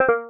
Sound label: ui cancel; grunk